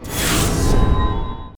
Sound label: menuhit; osu!